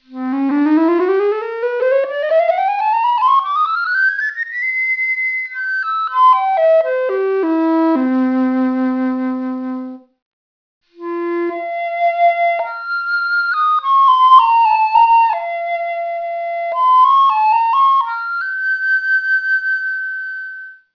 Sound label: flute; maitrise musicologie